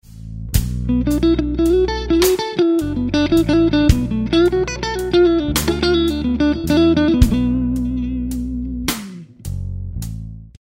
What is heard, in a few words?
Lick, guitar; Slow Minor Lick 6; Minor Blues licks